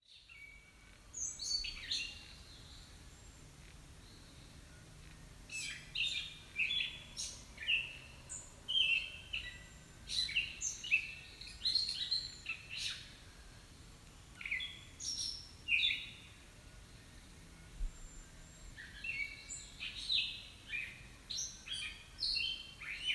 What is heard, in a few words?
Catbird near Beaver Pond; Richardson Road